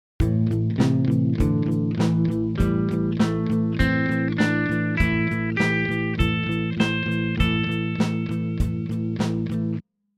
minor pentatonic; Listen to the consonant notes

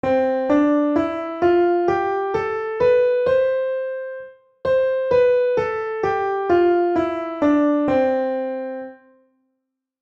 scale example

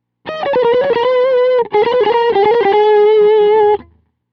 bonus lick

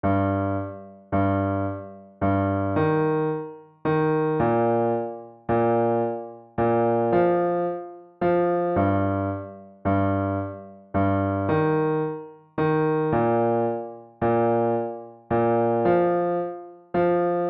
Rhythm Practice Example

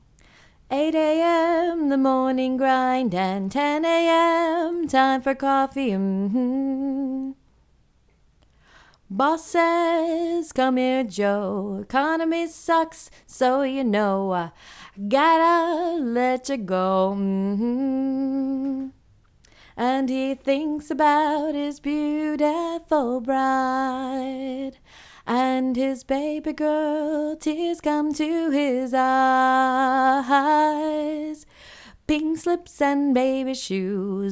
fem vox only

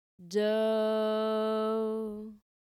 sung tonic

